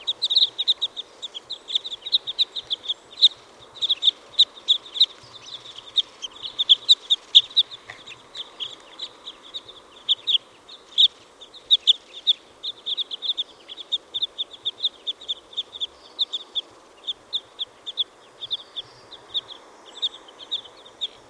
Scottish or Parrot Crossbill Type N20 - FCs

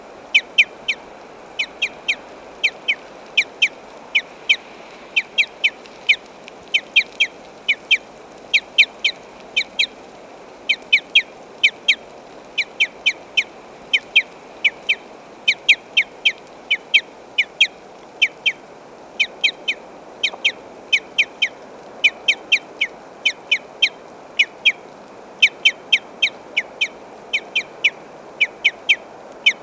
Scottish or Parrot Crossbill Type N20 - ECs

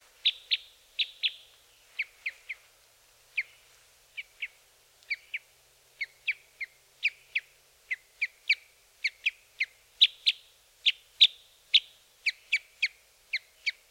Red Crossbill Type N07 - young bird FCs and ECs

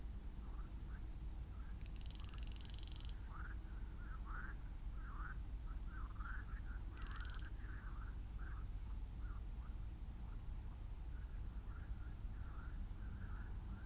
Baillon's Crake

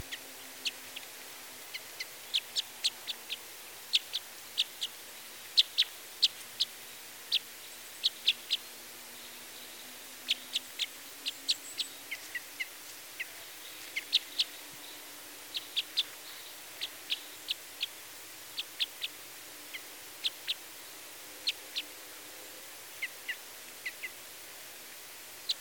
Red Crossbill Type N07 - young bird FCs and ECs

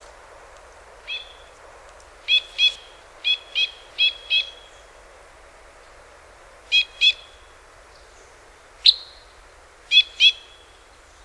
Two-barred Crossbill Type N19 - ECs

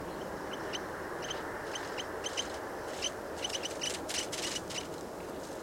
Type N04 - CCs, Crossbills

